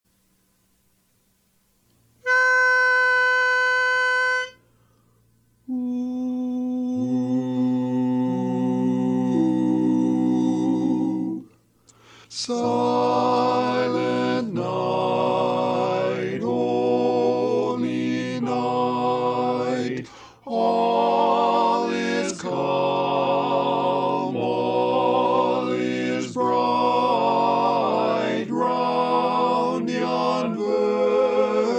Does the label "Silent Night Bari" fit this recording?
Yes